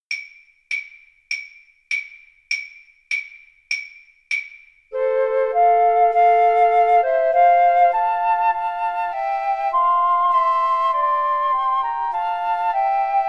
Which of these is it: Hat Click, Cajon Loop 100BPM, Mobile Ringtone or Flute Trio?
Flute Trio